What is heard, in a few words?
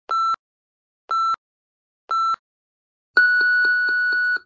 Beep Alarm; TIM